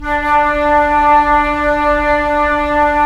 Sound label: Flute; FLT; C Flute f; Roland - LA Composer vol. 4